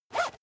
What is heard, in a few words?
zipper use short fast